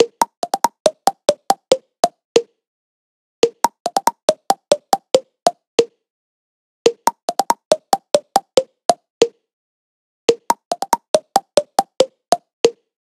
Tick Tock, phonetones alarms; LG X power 3

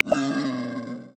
Wild Boar; Xiaomi Redmi-Note-8-Pro; South West Mountain

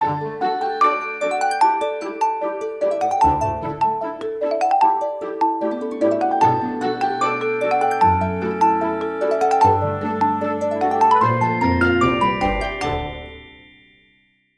Fantasy World, ringtones; Huawei P30

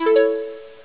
chord high; Sony Ericsson; P900

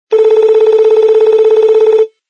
Low Bell; LG LG9600 Versa

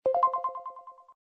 pc Connect, notifications; Coolpad; 5860

